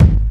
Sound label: short bass drum g sharp key, Royality free kick one shot tuned to the G# note. Loudest frequency: 153Hz